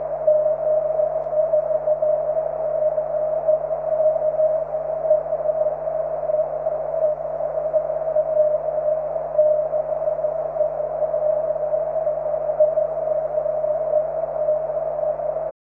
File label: cw; 23cm